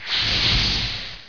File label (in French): ouvert